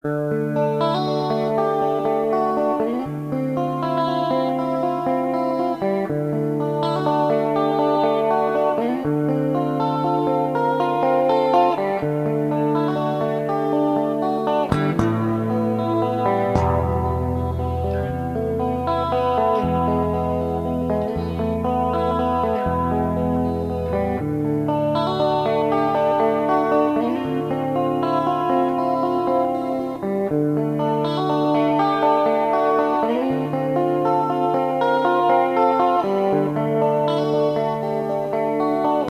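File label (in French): Guitare accomp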